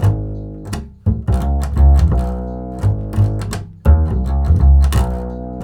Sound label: acid G#, 85 acid swing, bass; Spectrasonics; Bass Legends